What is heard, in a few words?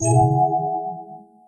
Storm; Audiosurf 2